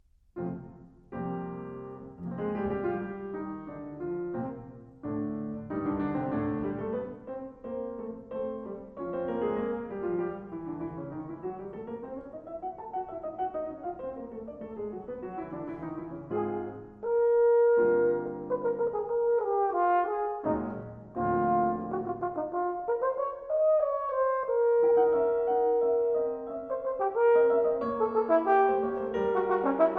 Sonata For Horn & Piano, Quasi menuetto allegretto